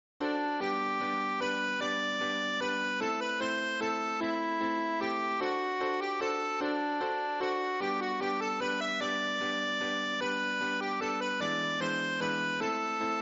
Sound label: waltz